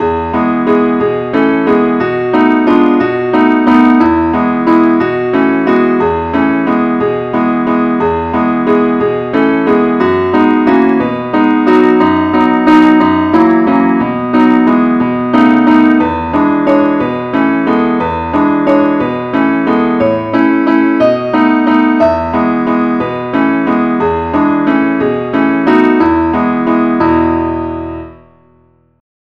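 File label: advance accompaniment key e; Angel voices, ever singing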